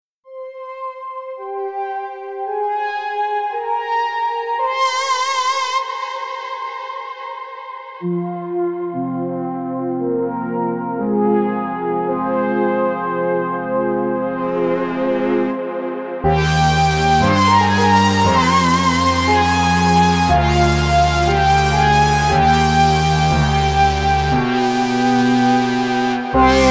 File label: gloomy brass; js80p; attila m magyar